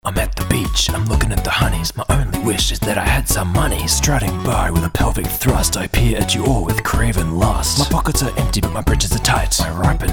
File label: beach song